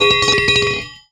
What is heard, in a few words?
metal pipe drop ground, metal bar bouncing on the ground